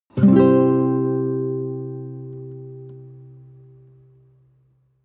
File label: nylon guitar chord full major